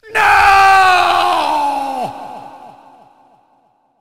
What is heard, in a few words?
death; handsome rogue